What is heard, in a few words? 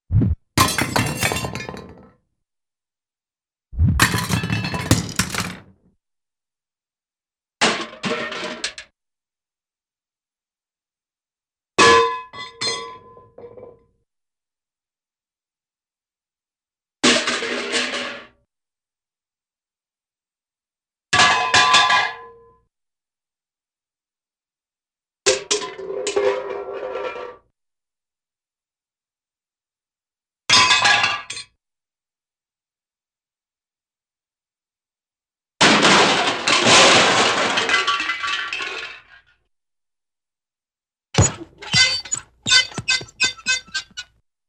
Swish Metallic Junk Crash, cartoon; Hollywood Edge; Cartoon Trax